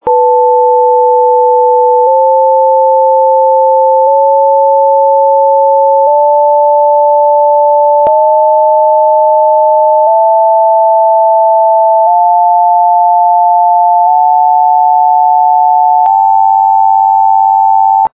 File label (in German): Differenztoene, Geige